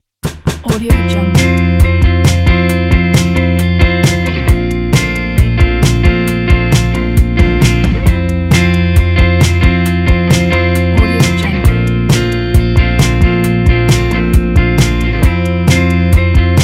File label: bedroom lofi indie